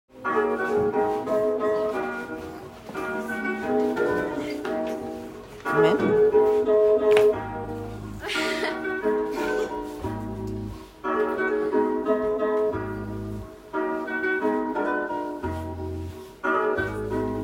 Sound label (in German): ABC Rap